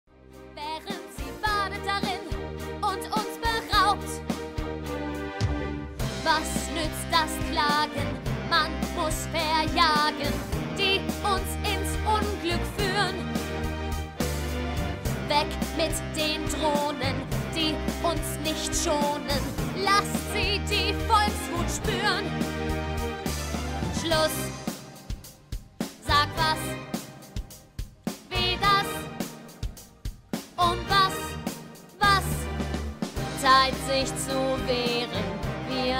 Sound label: bass